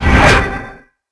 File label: mag out; cstrike; valorant; reaver vandal